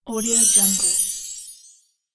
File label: sparkle shine